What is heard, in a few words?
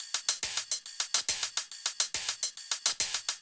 Shuffled Loops, 140 BPM